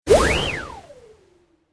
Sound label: Whistle Whoosh